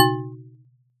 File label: bell 220